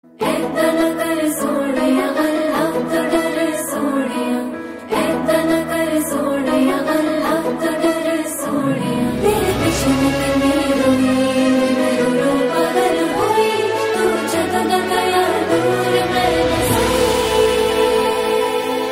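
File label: background music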